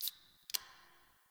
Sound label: Bar Soda Can; Warframe; Nineteen Ninety Nine; Hub; Hub Pub